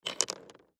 Pocket Knife Pick Up Rocks; Knives; Combat; Foley; Blastwave

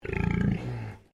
Animal Pig Landrace Inside Grunt

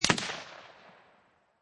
Rifle Remington 7Mm Single Shot Exterior At 75'; Rifle: Remington 7mm, Single Shot, Exterior at 75 feet.

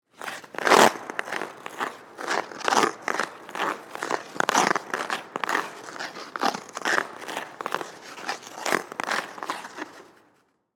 Ice Skates Twirl, In, Circle; Sports, Ice, Skates, Twirl, In, Circle, Slow; Ice Skating Sound Effects

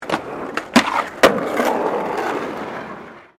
SKATEBOARD SKATE BOWL START; Skateboard sounds; Sports

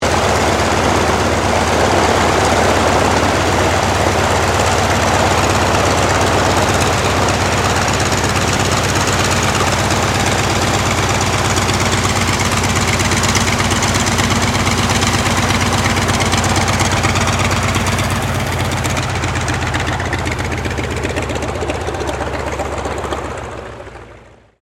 AIRCRAFT CAC WIRRAWAY TAXI STEREO; CA-16 Wirraway; Airplanes; Vehicles

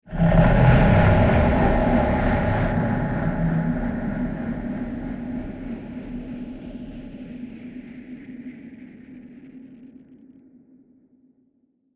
Horror Cross Wind Drone; Scary Soundscape Sound Effects; scary videogames; sinister halloween

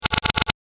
A Series Of Clicks

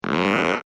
Cartoon Creak Rubber Stretch; Cartoons; Miscellaneous Cartoon

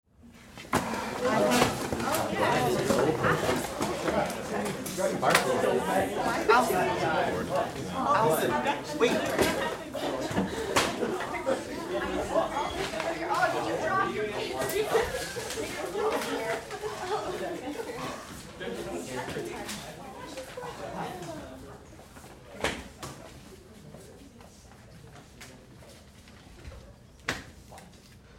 Crowd High school Class Up and Leave; Crowd , Highschool , Class , Laughs; Schools

